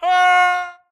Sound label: grunt death crush; Titanfall 2